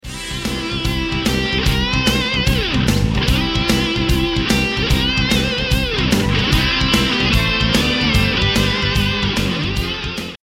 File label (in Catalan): Himne rock; fcb